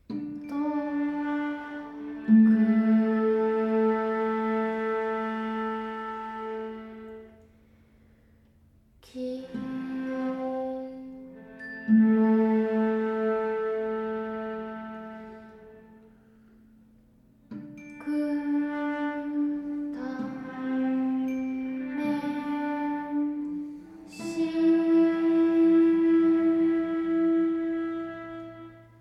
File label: sextet